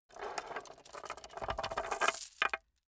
small metal rattle on wood; industrie wirtschaft; metall

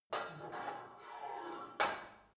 put weight onto barbell, fitnessstudio

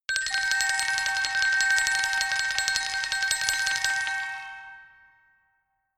glockenspiel mysterious tremolo high

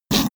sniff back; koerpergeraeusche; menschen; soundpool

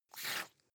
bubble over soft; gastronomie; berufe

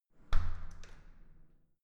entrance door close soft

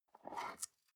storage lid slide open soft, box; objekte

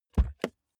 car bmw e46 interior door front open fast; Cars

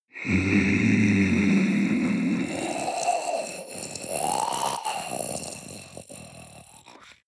zombie female breath throat; kreaturen

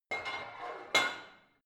barbell heavy plate put on hard, fitnessstudio

